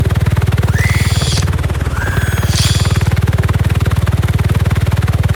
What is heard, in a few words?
hermit robot idle